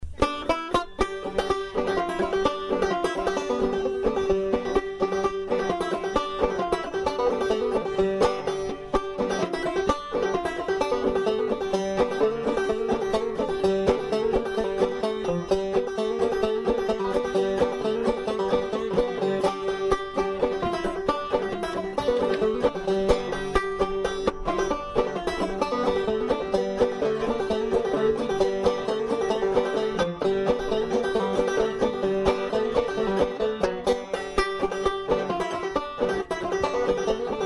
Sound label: Banjo Break